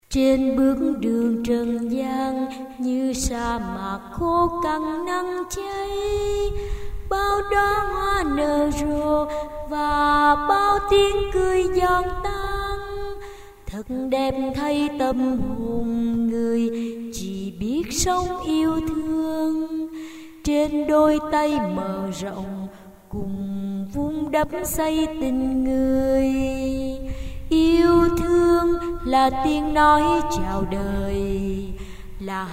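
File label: soprano alto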